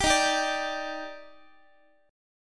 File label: D sharp Major 7th, Guitar; D#M7; strummed